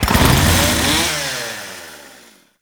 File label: Chain Saw Tonfa Accent Rev; Warframe; Grineer